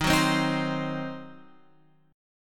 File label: D sharp Minor 6th D sharp; D#m6 Chord {11 9 10 8 X 8} strummed